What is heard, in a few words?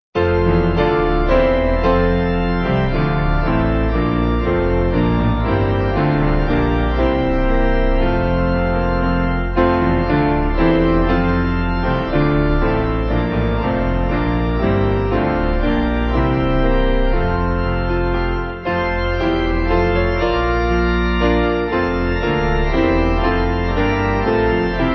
Piano Organ; Praise To The Lord